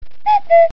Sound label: GAME WARN